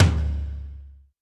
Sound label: big tom drum d key, Royality free tom single hit tuned to the D note. Loudest frequency: 792Hz